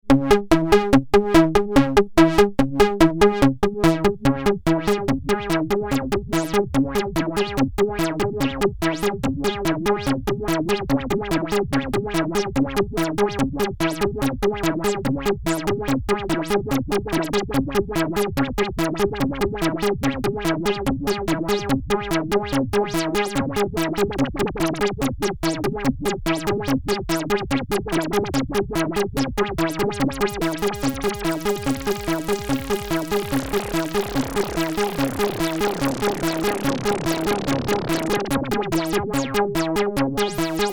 Sequercizer LFO VCF

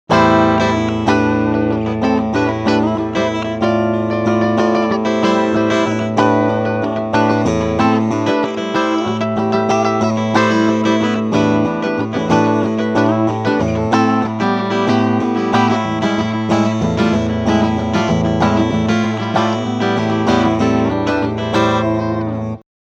Clean Strumming Bridge Humb

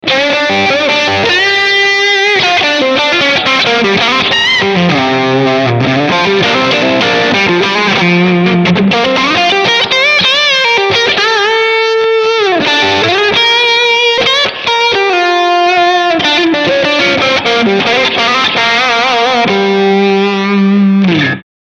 Leadline Position II

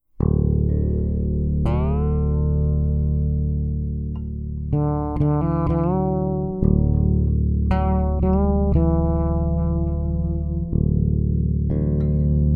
Flat Wound Bass Boost Dropped D

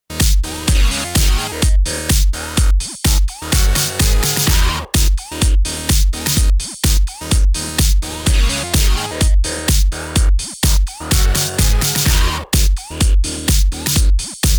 Chip Boy LPF; electribe

